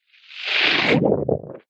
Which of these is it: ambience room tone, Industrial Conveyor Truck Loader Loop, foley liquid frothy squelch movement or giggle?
foley liquid frothy squelch movement